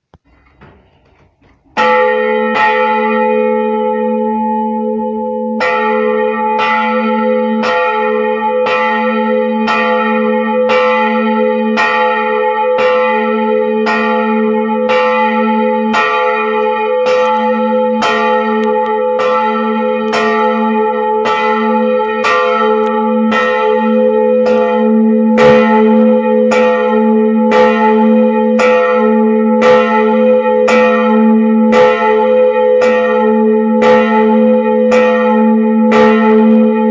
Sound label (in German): glocke